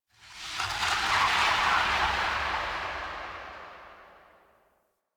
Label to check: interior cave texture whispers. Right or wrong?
Right